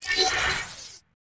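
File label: ui transition; Quantum Redshift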